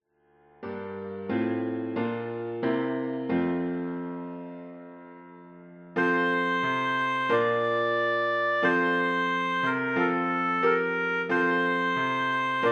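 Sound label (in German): O du fröhliche Oboe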